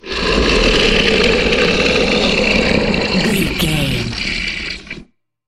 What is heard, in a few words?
troll monster growl long